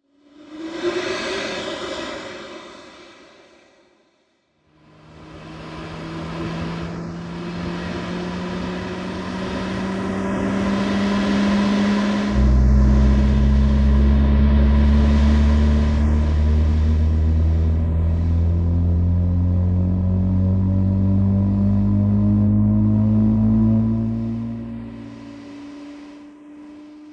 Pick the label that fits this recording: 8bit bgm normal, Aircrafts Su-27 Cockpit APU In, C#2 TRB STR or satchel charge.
Aircrafts Su-27 Cockpit APU In